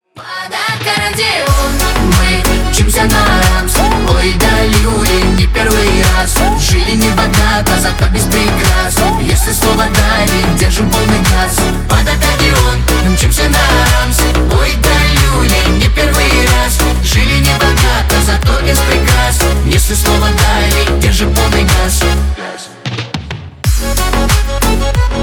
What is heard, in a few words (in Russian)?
Под аккордеон